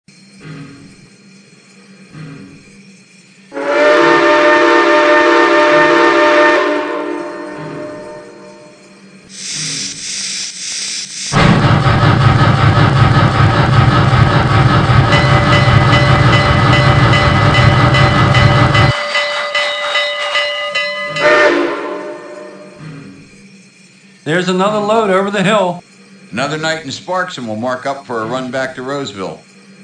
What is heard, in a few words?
Cab Fwd, electric trains; SP